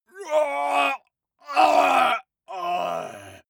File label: male groan painful triple; menschen; schreie